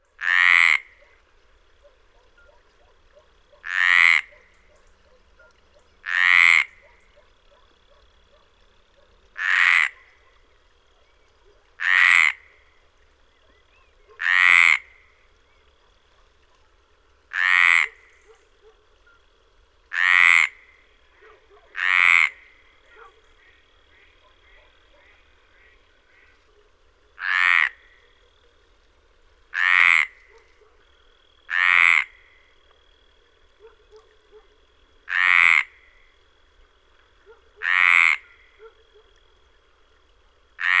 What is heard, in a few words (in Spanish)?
Ranita Meridional Hyla Meridionalis